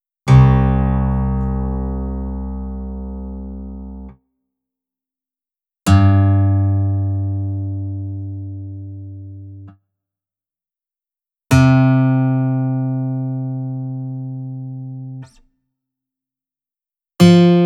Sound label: Drop C, guitar